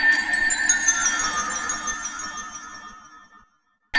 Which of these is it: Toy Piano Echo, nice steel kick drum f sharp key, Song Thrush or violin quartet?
Toy Piano Echo